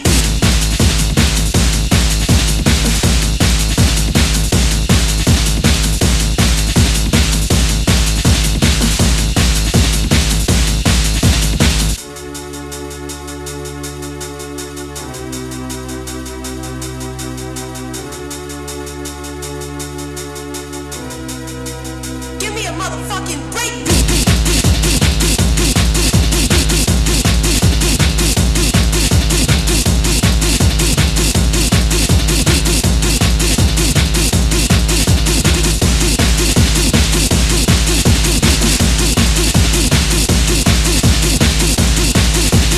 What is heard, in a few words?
Breakbeat, hardcore gabber